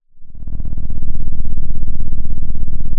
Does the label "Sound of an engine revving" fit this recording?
Yes